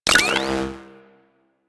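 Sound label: Orange Projectile Hit; Warframe; Venus; Venus Commander Orange; Abilities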